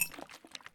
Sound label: drop, waterglass; Escape from Tarkov